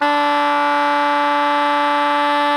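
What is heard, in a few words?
SOPRANO D 3, Saxophones, SOPRANO GROWL; GRWL; Greytsounds; Philip Wolfe David Crozier's Saxophones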